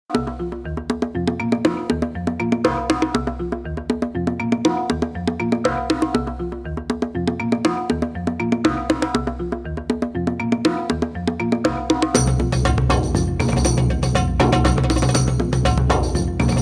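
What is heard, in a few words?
percussion drum bongos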